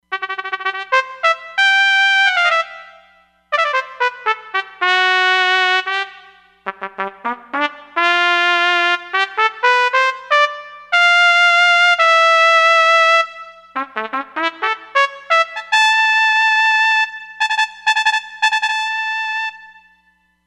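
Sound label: Solo Trumpet, Roland Fantom XR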